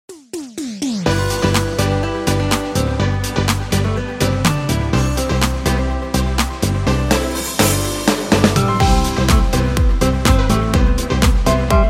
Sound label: harmony sample